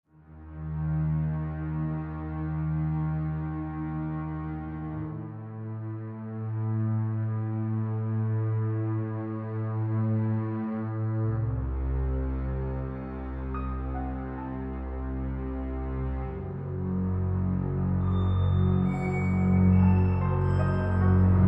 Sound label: orch twist uncertain